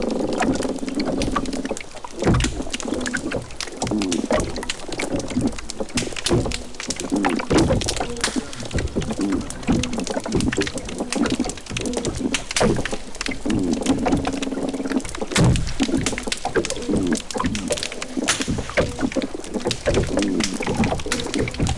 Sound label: Spotted seatrout individual, fish sounds